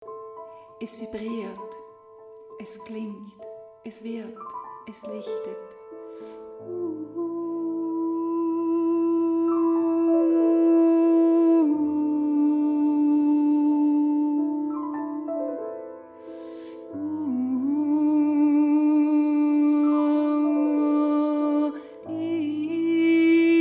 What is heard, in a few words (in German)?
Klang Licht Klang